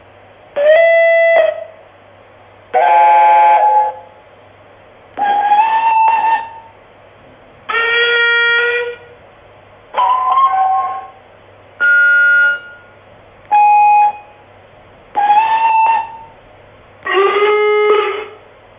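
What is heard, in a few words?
european whistles, locomotive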